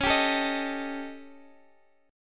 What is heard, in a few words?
Eb 7th Flat 5th Db, guitar